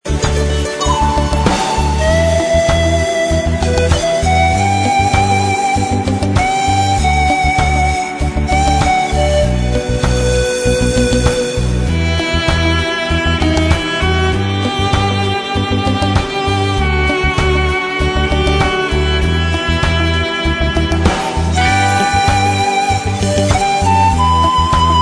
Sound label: song of the ocarina; modena